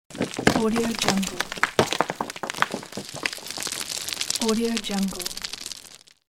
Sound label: stone rolling